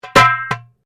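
Drum Darabuka Slap Mute forte, Egyptian Darabuka Drum; Drums; Musical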